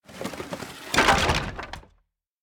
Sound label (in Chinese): 孤儿院走廊 桌椅撞倒